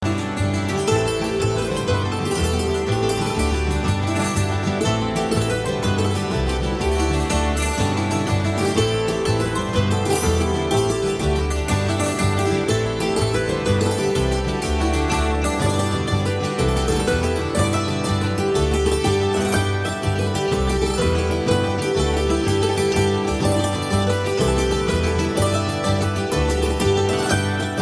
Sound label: Swedish Jig; Blarney Pilgrim